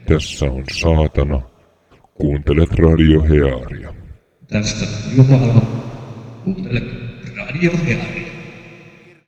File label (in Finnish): jingle